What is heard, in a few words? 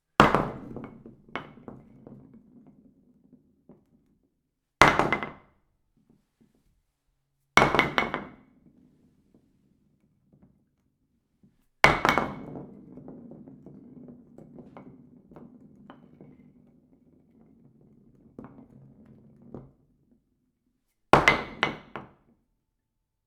wood bowling pin drop series